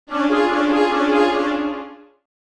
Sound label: Cartoon accent